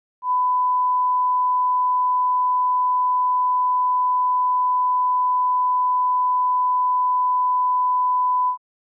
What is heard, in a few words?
Test Signal